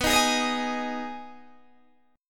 B 6th B down Guitar Open D

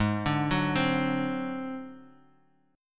G sharp 7th Flat 5th, Guitar, Open D; 6,5,4,6,x,x; down; chord shape

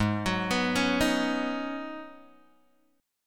G sharp Minor 11th G sharp Guitar Open G, chord

